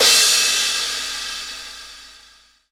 roomy drum crash sound c key, Royality free crash sound clip tuned to the C note